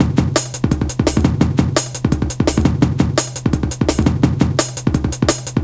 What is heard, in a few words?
Hardloop, Drum & Bass; Fluid Dynamics